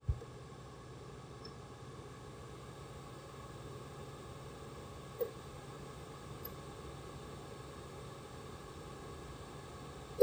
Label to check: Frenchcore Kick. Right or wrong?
Wrong